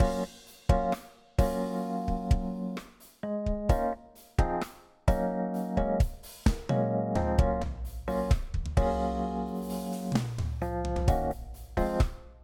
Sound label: Upbeat Future Garage Funk